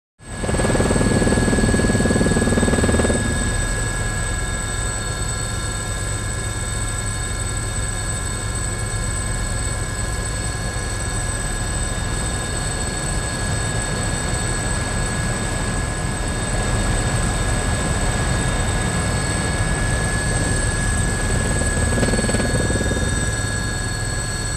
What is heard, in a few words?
Helicopter in flight